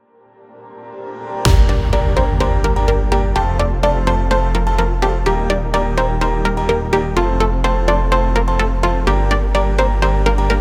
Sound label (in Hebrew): טראק דיפ האוס